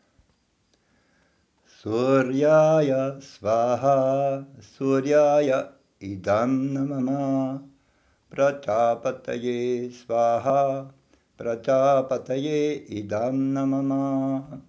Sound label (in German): agnihotra mantra sonnenaufgang